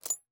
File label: add Score; LinkGame; LuaDemo; Gaming